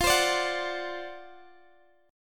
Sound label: D 7th F, guitar; D7/F; strummed